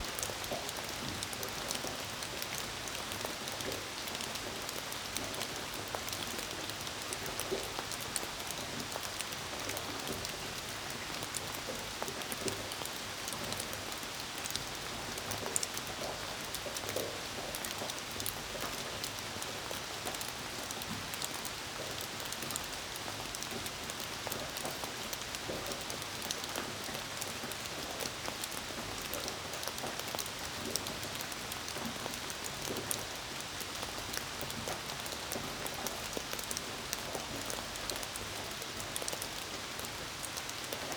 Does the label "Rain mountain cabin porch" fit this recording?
Yes